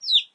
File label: Chicken Idle